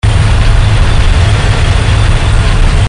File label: mammoth idle